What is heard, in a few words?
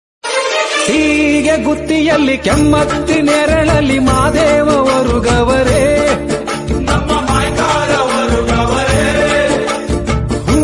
Bhakti Ringtone